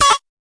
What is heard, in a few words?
fx drum, Electro FX